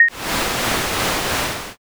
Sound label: aircon